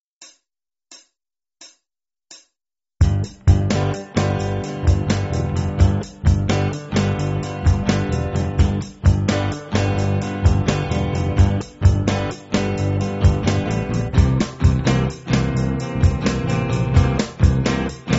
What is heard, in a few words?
Blues in G Backup Track; 15-3 – Aural Skills In Context